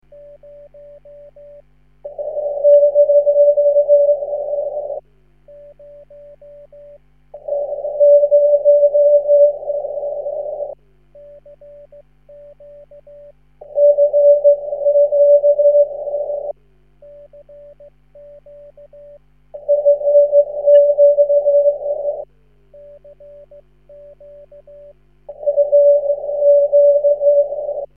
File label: echo test, 9cm; G4CCH